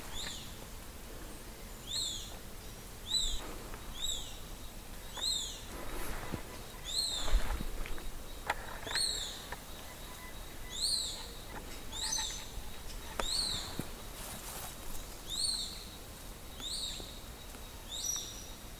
Pseudastur albicollis, Grote bonte Buizerd; roofvogels; Accipitrinae; Accipitres; Accipitidae

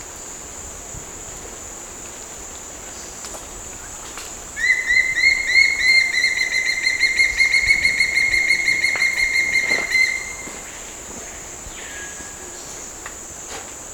Morphnus guianensis, Wurgarend; roofvogels; Accipitrinae; Accipitres; Accipitidae

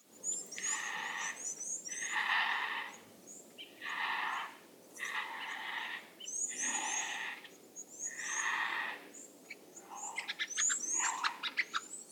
Gyps africanus; Witruggier; roofvogels; Accipitrinae; Accipitres; Accipitidae

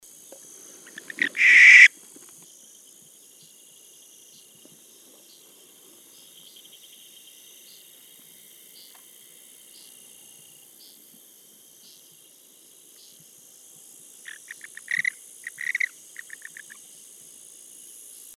Tyto capensis, Kaapse grasuil; uilen; Tytonidae

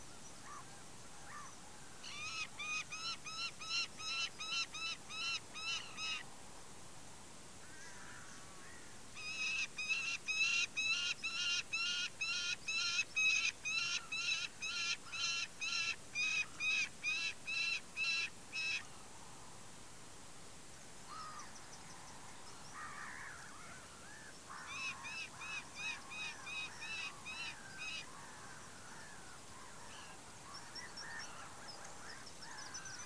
Falco peregrinus, Slechtvalk; roofvogels; Falconidae; Falconae

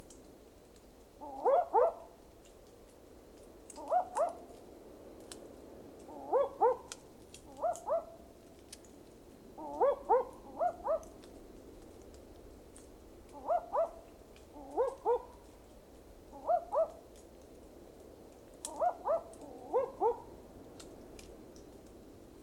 Ninox connivens peninsularis, uilen; Blafuil; Strigidae

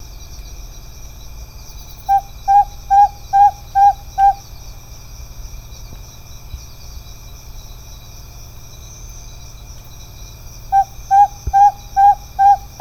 Otus rutilus, Madagaskar dwergooruil; uilen; Strigidae

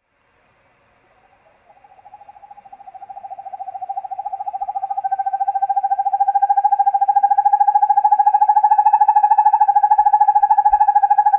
Surnia ulula, Sperweruil; uilen; Strigidae

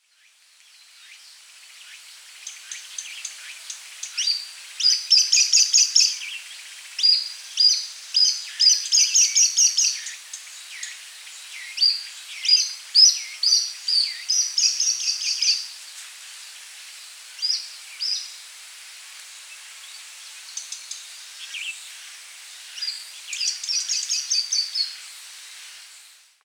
Accipiter soloensis; roofvogels; Accipitrinae; Chinese Sperwer; Accipitres; Accipitidae

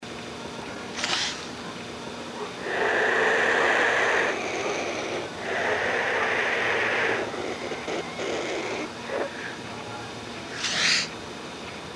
Coragyps atratus; Zwartegier; roofvogels; Cathartidae; Cathartae

